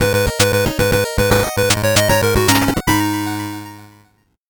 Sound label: 8bit jingle slow